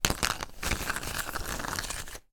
conkers many movement in hand; zapsplat

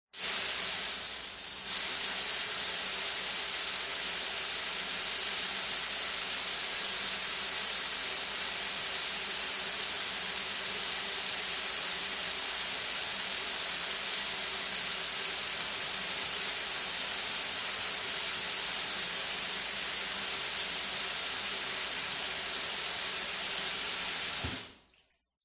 household shower run off

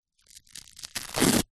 household plaster band aid peel from hairy skin

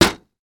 impact glass window thin; sound effects one

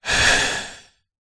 human male middle aged single breath

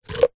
sport cone plastic stacked pull release

